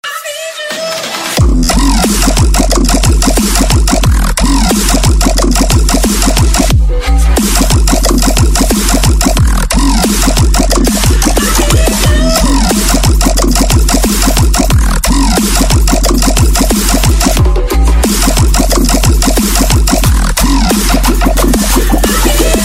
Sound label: Dance